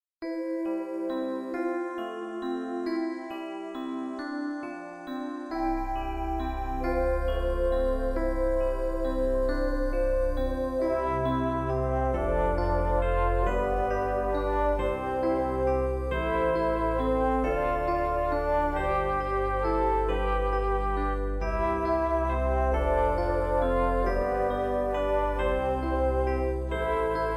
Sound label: Instrumental; Bichi